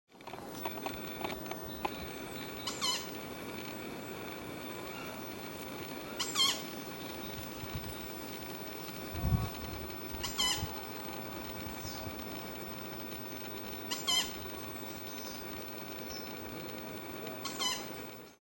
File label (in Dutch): contactroep bosuil; vogelgeluiden